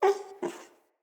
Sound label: Wolf Cub Trapped Call Far, Animals, Wolf Cubs, Vocals